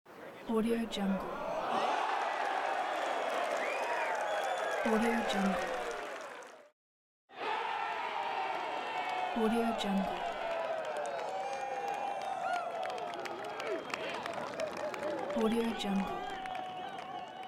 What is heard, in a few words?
golf crowd applause and whistling